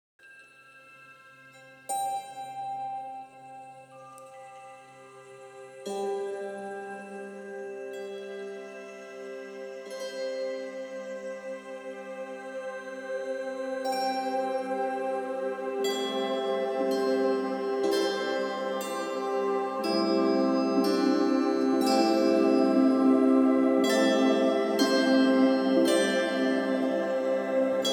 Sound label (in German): 528 Hz Tischharfen Meditationsmusik